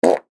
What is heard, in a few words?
Human Fart Small; Blastwave